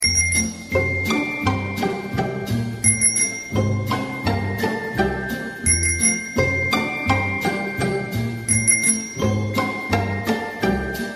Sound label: Bike